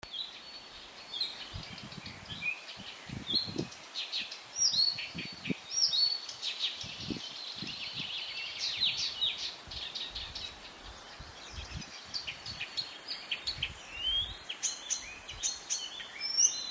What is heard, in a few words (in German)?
buschrohrsaenger